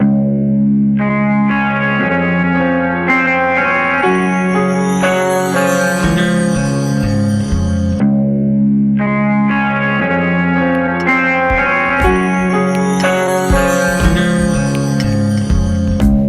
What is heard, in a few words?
beat